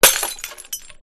Glass Bottle Break Smash Long Crisp Bright Individual Shards at the End; skyes audio